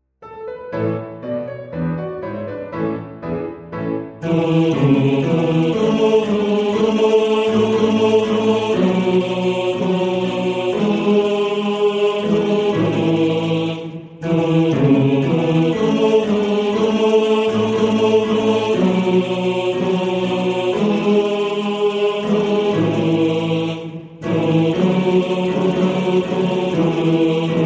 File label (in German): Bass; Soldateliedli; Bass 1